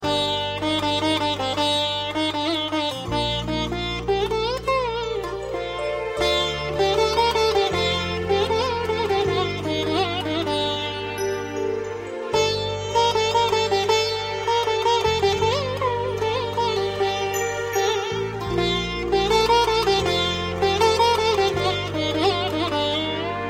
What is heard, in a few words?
Hearttouching Tone